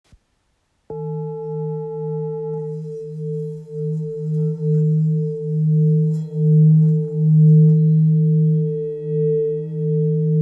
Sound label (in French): Bol therapeute si 910g